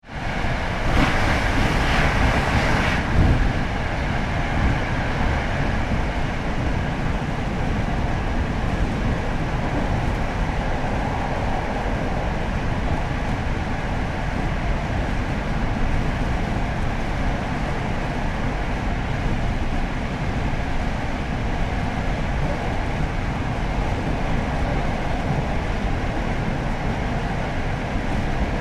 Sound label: Train Electric Interior High Speed Travel; Trains; Vehicles; Tom Hutchings